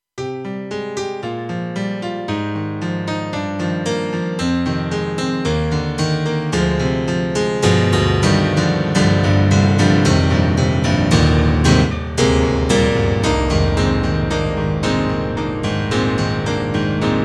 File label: Grand Piano; KORG; TR-Rack; M1 Grand Piano